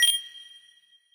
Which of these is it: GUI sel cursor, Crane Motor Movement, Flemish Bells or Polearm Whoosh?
GUI sel cursor